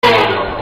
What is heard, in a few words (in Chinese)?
嘉宾灭灯; SweetParty; 狸猫新增; 相亲房